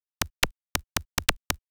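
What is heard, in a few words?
SNARE ELECTRO 140, Techno Drum; Techno2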